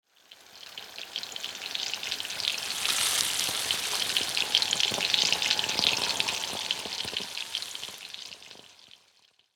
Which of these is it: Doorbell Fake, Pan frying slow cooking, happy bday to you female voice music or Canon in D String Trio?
Pan frying slow cooking